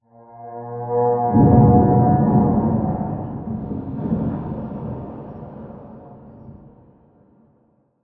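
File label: Explosion Rumble Interior Distant Tail; Boomtown; Titanfall 2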